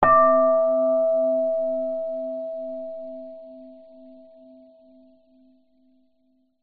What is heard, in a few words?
bell medium large soft hit chime; zapsplat